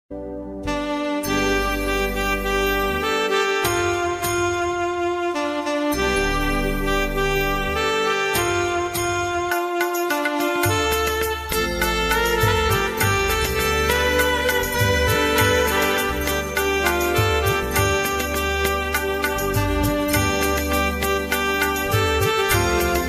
instrumental ringtone, desh bhakti ringtone, republic day ringtone